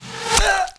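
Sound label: arrow death; AoM-ARTofMyth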